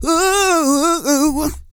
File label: SING, Vocal Planet R&B & Dance; ILIO